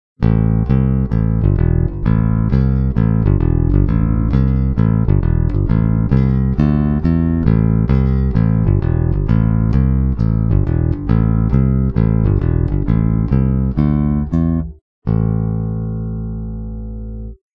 JB neck

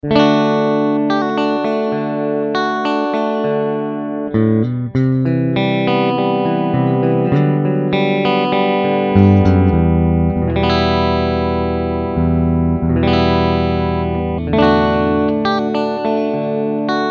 Bridge PAF Neck Humbucker

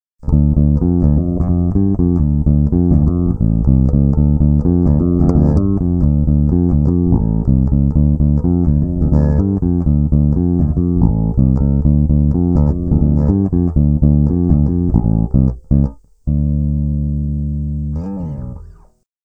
Neck parallel, bassblaster; rownolegle

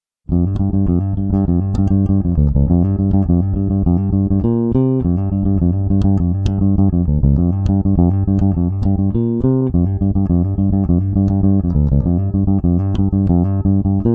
Neck parallel, bassblaster; rownolegle

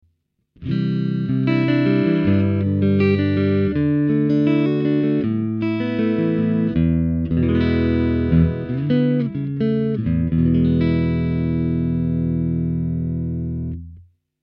Neck (Single) Clean

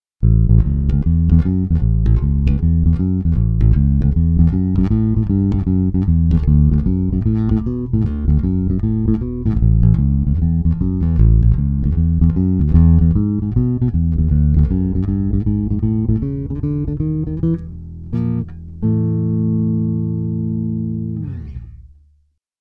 Blues finger, pbhumbucker

